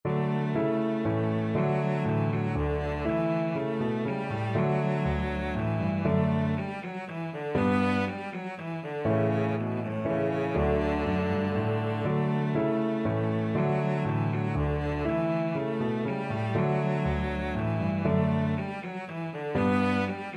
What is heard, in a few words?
minuet, cello